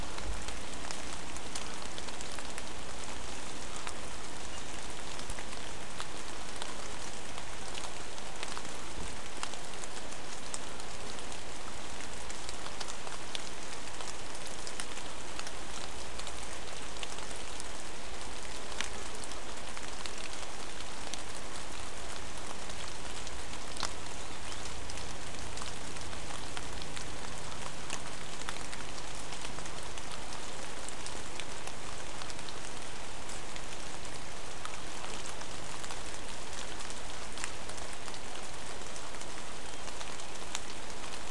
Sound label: mainly rain